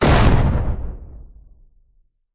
science fiction gun weapon powerful cannon blaster